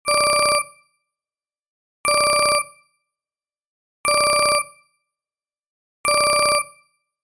bright bell ringtone

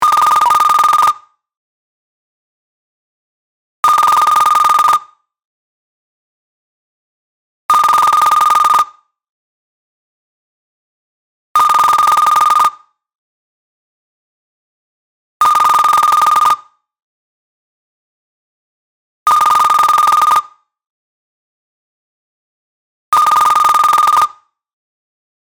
ringtone

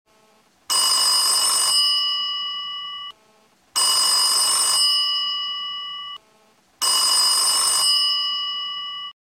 vintage telephone ring

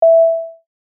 short punchy sine wave ding e

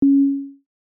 short punchy sine wave ding c

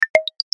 notify

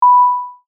short punchy sine wave ding b

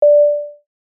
short punchy sine wave ding, D

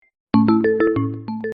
notification

